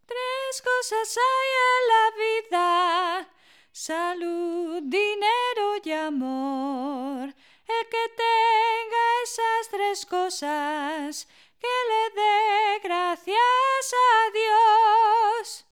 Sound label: soprano